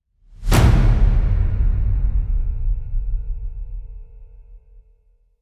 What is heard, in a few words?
Red Battle Whoosh; Gothic Storm Music